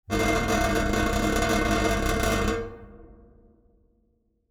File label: Metal Vibtation